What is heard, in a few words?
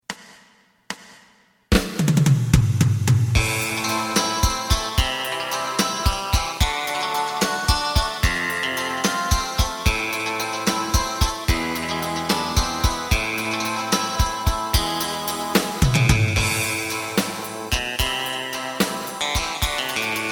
bass